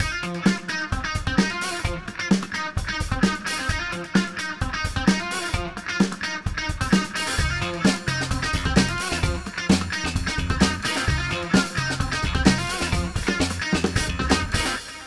riffendium

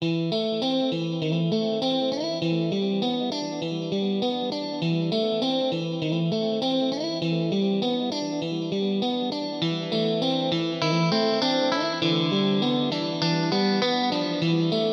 guitar xm

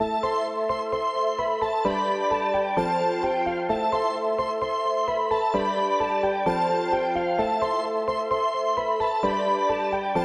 beat

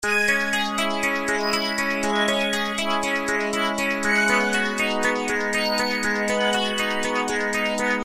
guitar

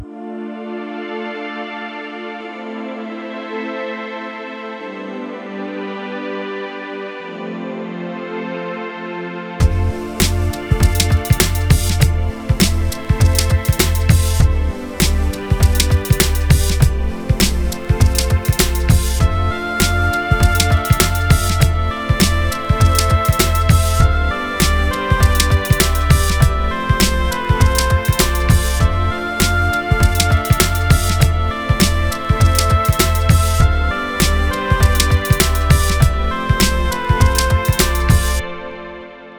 pad

